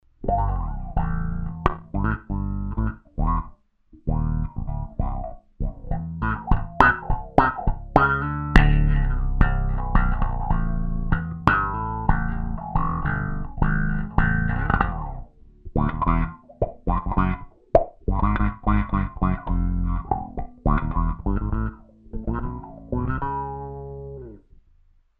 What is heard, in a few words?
UNITE, Zoom 506 II